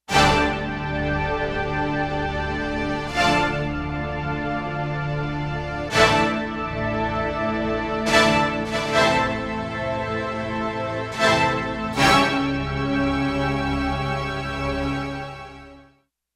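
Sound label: Sfz Orchestra; Digital Synthesizer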